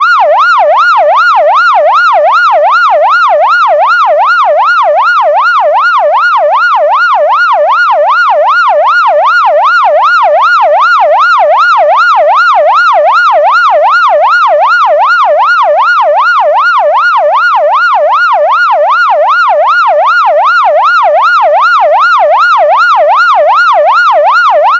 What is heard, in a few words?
electronic siren